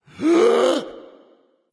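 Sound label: nonverbal breath; smite; voicepack; ullr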